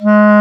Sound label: WND CLARI, Clarinets; Clar Bryt